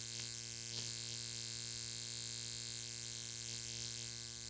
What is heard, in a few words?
loop neon light buzz quieter